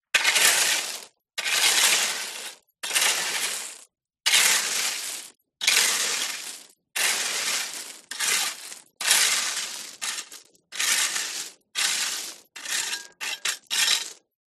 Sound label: Raking Gravel